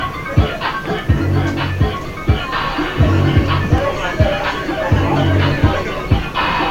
boombox beat

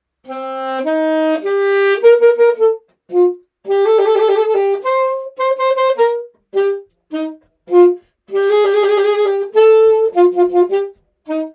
march pear saxophone

